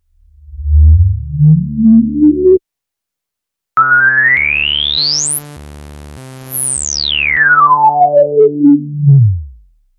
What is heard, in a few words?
Nord Lead 2 Saw Wave 24db LPF; Nord Demos; Nord Lead 2 versus 3